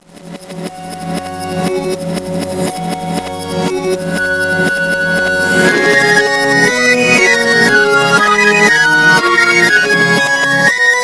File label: jig